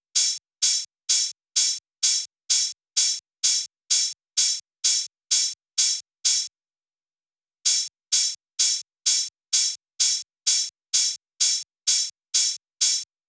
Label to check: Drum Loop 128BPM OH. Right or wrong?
Right